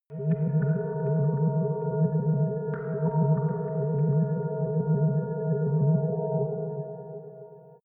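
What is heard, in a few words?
alien layer, science fiction; atmosphaeren umwelt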